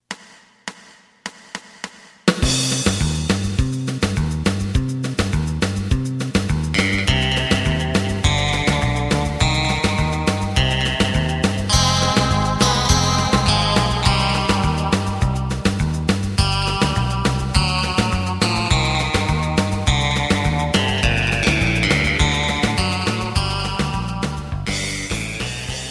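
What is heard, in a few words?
side guitar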